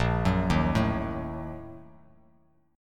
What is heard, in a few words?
A sharp Major 7th Suspended 4th Sharp 5th, Bass Standard; arpeggiated